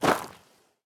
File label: gravel, step; Minecraft; Conquest Default